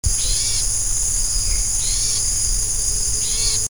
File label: Troglodytes aedon alarm wheeze